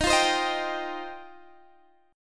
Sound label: G 7th Suspended 4th Sharp 5th Eb